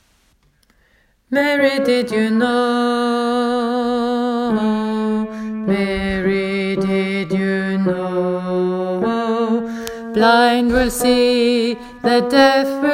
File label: mary tenor; åfjord